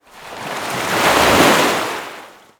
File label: Waves, ambient; Totaled; XBOX